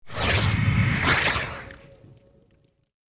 Horror accent eerie hiss

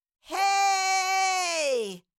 Cartoon little child voice shout scream long

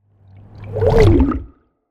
Cinematic underwater whoosh

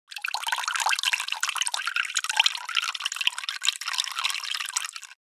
Liquid drips into water

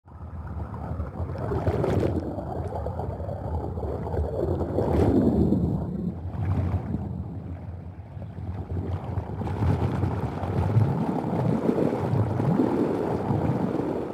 Swimmer underwater movement

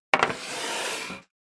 Tray slide on a table

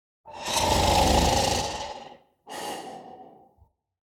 Demon king lord snore sleeping

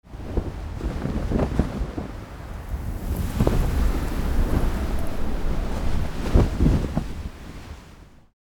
Ship flag flapping flag pole strong wind

